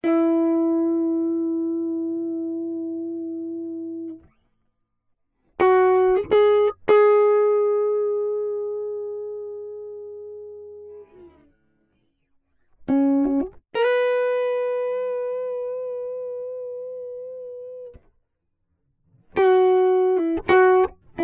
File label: Jazz Guitar; Ueberschall Jazz Guitar